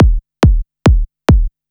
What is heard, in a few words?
Kick Loop, Drums, Dance Beats